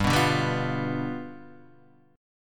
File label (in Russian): Boj G7